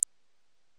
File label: tap; linxia